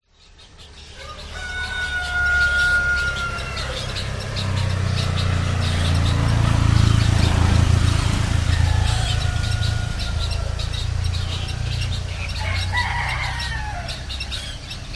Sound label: public places village indonesia roosters white herons traffic; pitulu